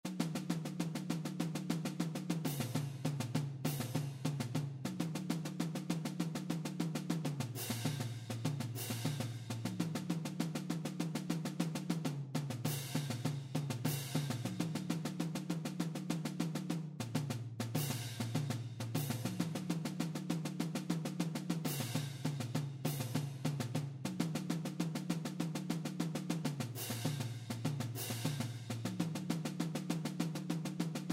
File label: cadence takadimi